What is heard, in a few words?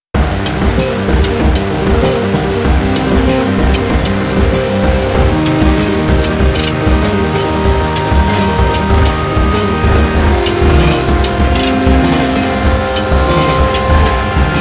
mucky piano; weeklybeats 2012